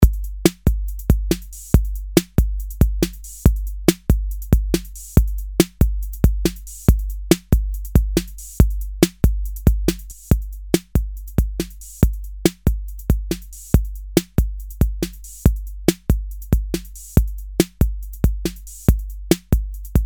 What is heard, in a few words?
808 COMP Pre EQ Post EQ